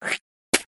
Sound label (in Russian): Звук плевка; звуки, уведомление, на уведомление, на смс